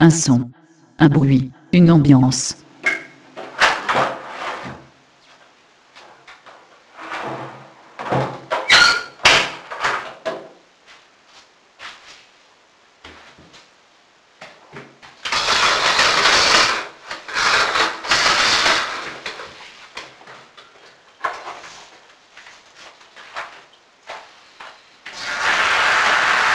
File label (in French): Un son un bruit une ambiance